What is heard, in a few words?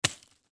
Pocket Knife Open; foley; Drop Dirt; Drop Sound Effects; Objects; Blastwave